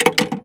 fx click

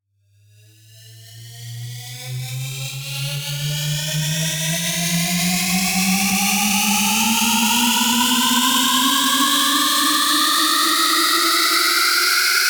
Dubstep Riser - 150 BPM G; Cymatics; Risers

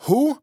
Dry Chant - "Who"; Cymatics; Euphoria Vocal Sample Pack; Chants

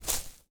Footstep Grass Medium; Footsteps

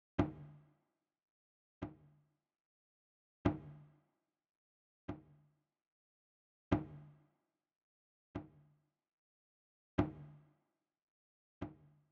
Drum Loop 147 BPM Percussion; Loop Stems; Cymatics; Vibes II Drum Loop Collection; Sparkles

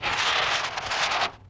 Sandpaper Skids; Vintage Cartoon; Foley

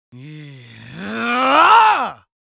Yells, Viking Vocal

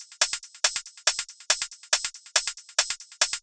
Hi Hat Loop, Psytrance

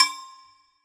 Hydroflask Perc; Cymatics; PHARAOH Beta Pack; Pharaoh Premium Drum Samples (BETA); Percussion

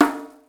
Djembe, Afrohouse; Afro Drums; One Shots

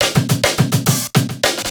Riddim Drum Fill 140; Ghosthack; Riddim Essentials Volume 2

